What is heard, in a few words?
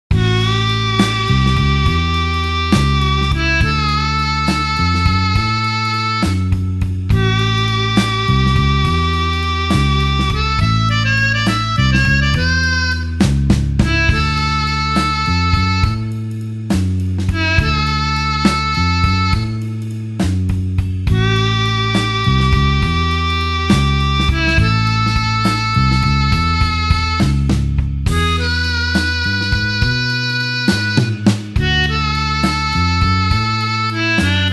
blues harp slow A